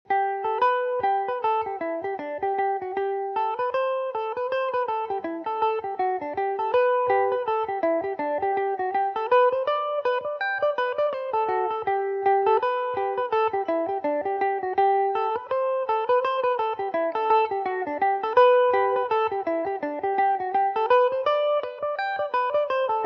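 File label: father kellys G major mandobird revisited, mandolin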